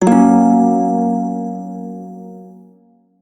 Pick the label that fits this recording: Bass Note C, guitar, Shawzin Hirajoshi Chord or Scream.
Shawzin Hirajoshi Chord